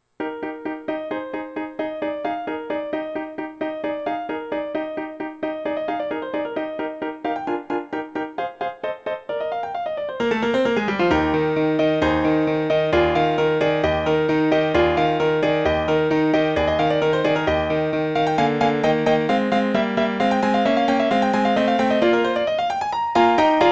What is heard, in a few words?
trompetenkonzert, trumpet; haydn; トール音楽工房